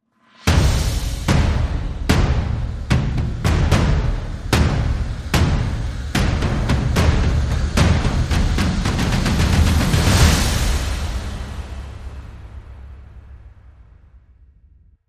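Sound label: Dramatic Sting, No Orchestra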